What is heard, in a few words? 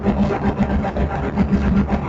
SFX Zoom